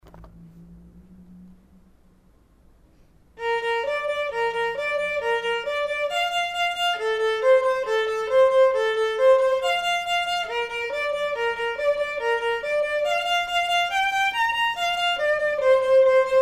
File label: perf vln